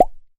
comedy pop finger in mouth

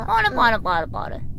polka

